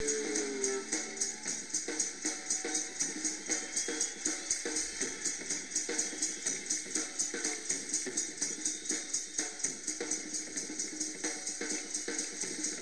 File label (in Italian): Batteria